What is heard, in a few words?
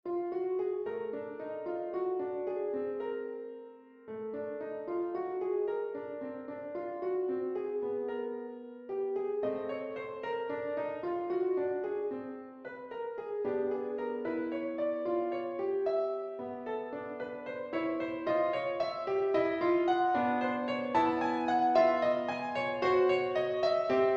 Five Bells for piano